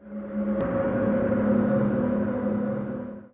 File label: Evil